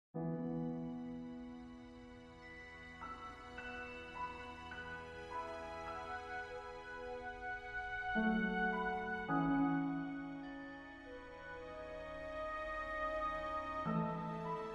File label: Strategy Ambience; Master of Orion